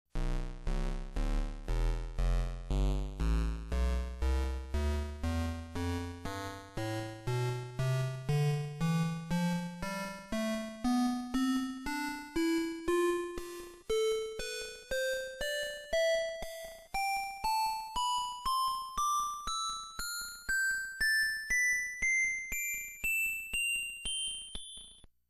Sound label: musical scale